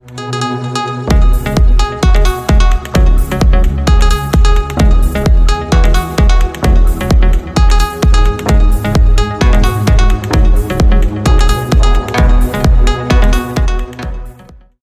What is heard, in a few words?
nice trance ringtone